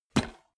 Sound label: bmx bike grab bar soft